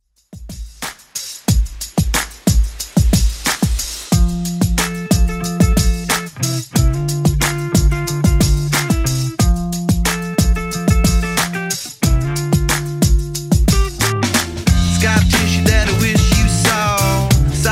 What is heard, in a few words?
90s Alternative Rock Redrum 91bpm